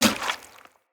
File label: Heavy Grunt Boot Walk Water, Footsteps; Sinecure